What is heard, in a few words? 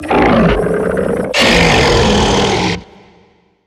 beast vocal ghost attack roar